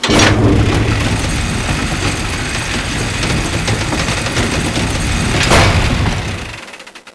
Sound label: gear, F6F Hellcat; Bombable; flightgear